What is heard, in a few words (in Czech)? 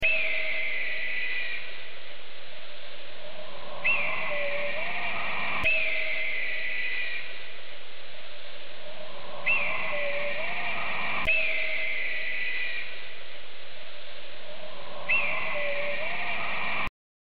sokol stehovavy